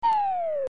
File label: SFX Fall; meco kong windows gamerip 2013